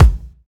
kick drum one shot a sharp key, bass drum single shot tuned to the A# note; kick drum samples